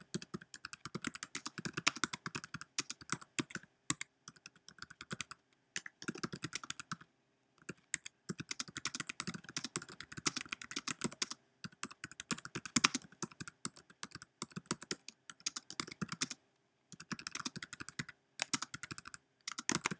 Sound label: keyboard